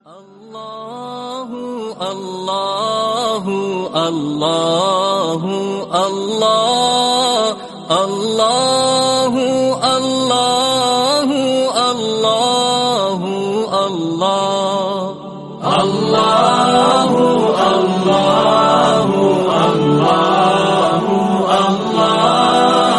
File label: allah hoo allah allahu allahu islamic